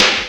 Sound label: HOBBY SNR; 90 sSampleCDs; Crypton - DrumBase5000; DR55+110+220